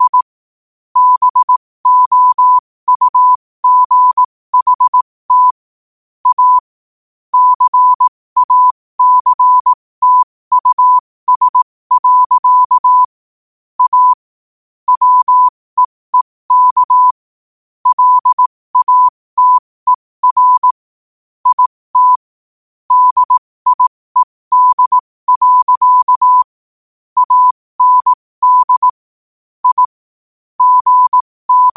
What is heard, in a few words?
5 wpm, morse